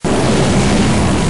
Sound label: inferno dragon fire loop